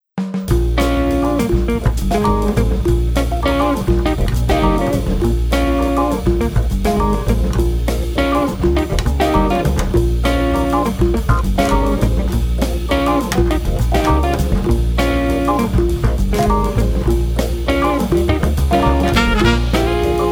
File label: sticks